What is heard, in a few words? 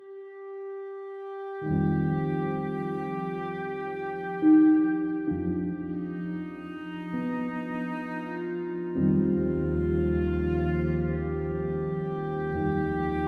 Ending T67 4-4; Mistover